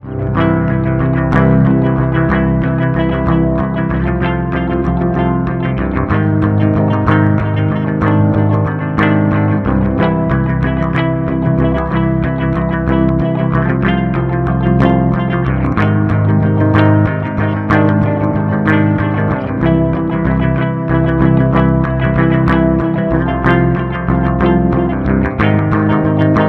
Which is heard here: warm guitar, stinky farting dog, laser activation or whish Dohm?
warm guitar